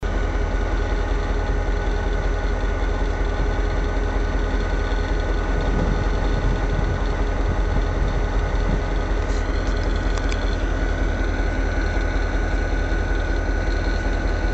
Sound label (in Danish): me tomgang